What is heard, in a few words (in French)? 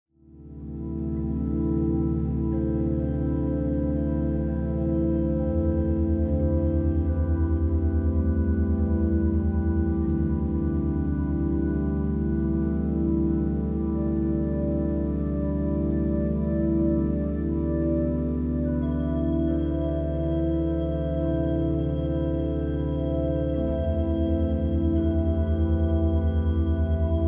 Orgue